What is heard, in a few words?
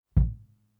Kicks; Touch Loops; CosmicFunk